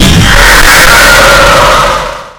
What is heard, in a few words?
screamer